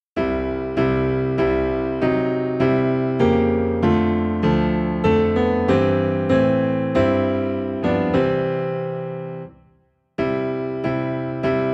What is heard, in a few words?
Piano Low in C